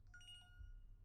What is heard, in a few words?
alert; IEEE AASP